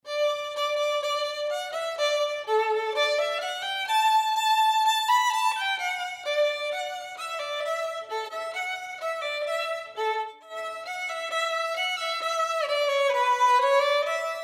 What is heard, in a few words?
March